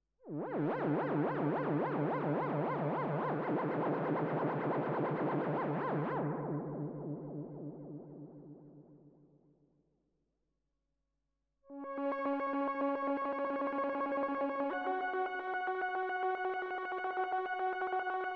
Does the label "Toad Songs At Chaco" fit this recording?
No